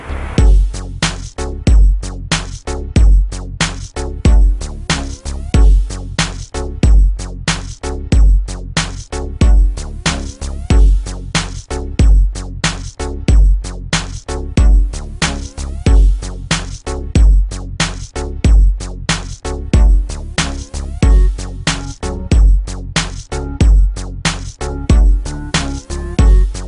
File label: HIP HOP BEATS